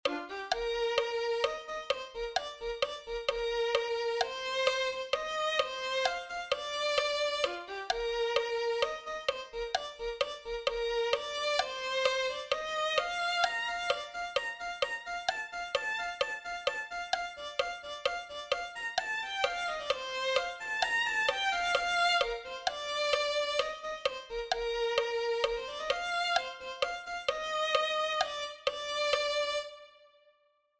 violin

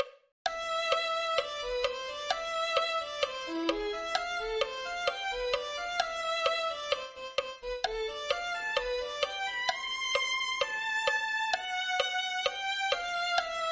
violin

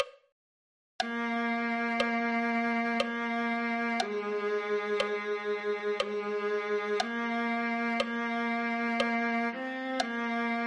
viola

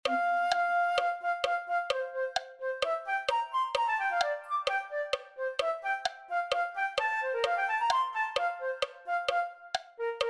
flute 1

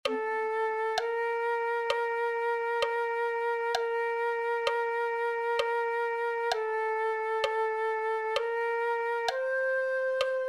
flute

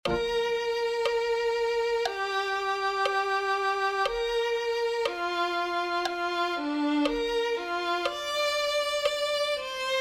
violin 2

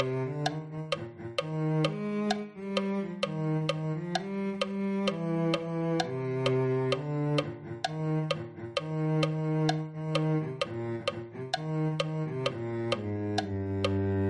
cello

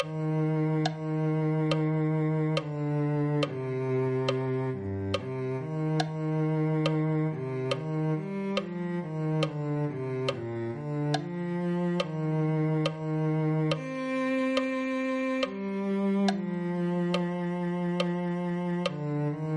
cello

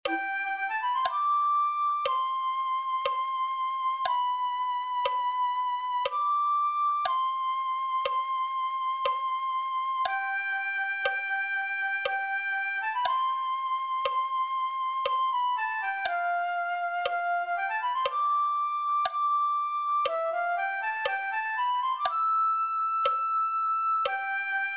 flute

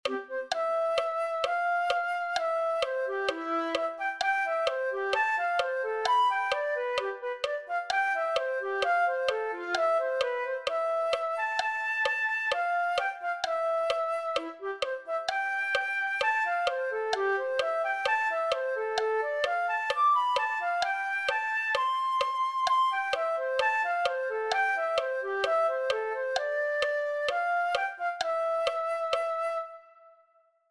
flute

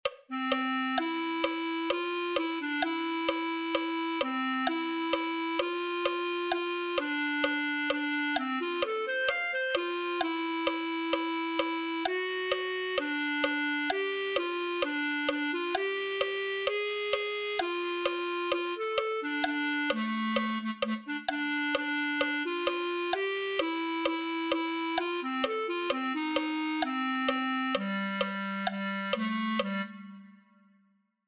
clarinet

